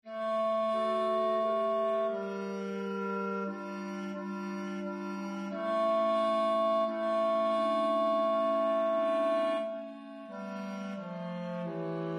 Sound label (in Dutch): bas